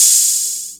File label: HH OP; HH OPEN; AKAI; USB Soundscan vol.20 - Fresh Disco House I